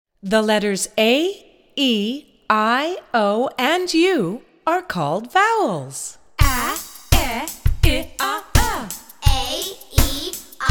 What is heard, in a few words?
Phonics Songs Short Vowel Rap